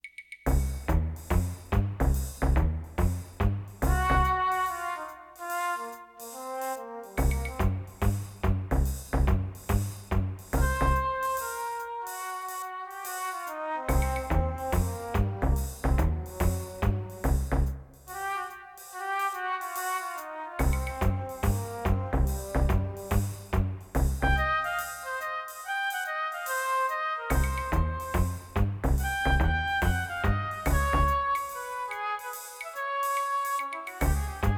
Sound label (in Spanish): musica jazz fondo